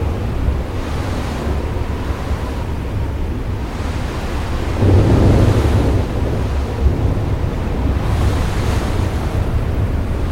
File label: AMB Worm Hole Xtreme; Duke Nukem Forever